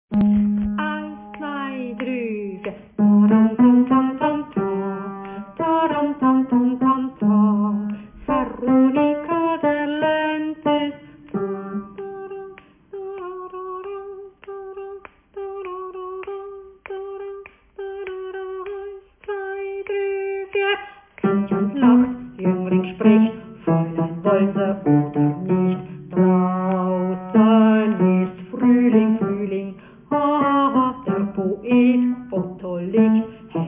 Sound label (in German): Tenor